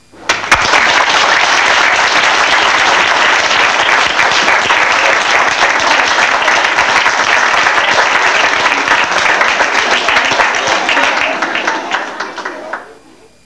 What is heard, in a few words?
CLAPPING; VOICES